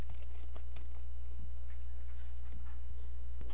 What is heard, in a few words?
beat